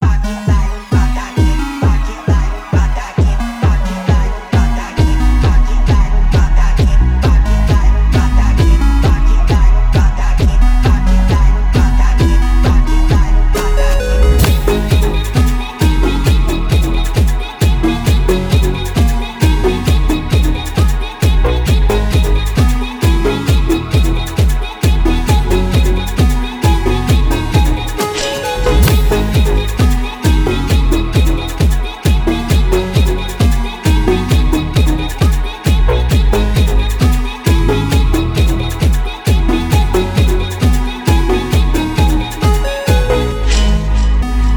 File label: phonk 2025 mix ultrafunk speedup